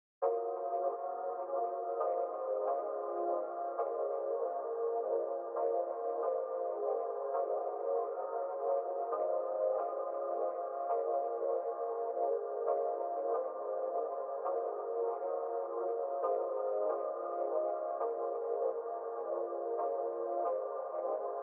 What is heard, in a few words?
pad